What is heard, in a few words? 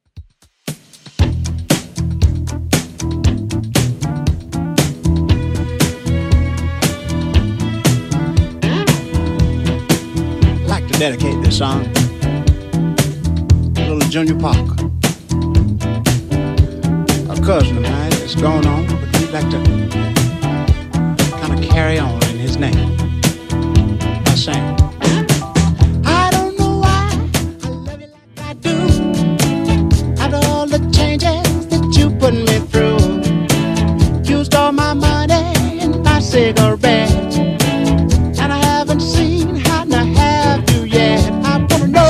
70s Redrum 117 bpm